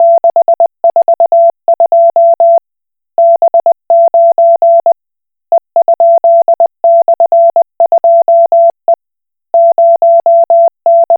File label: Koch Morse